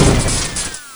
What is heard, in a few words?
portal, weapons; quake2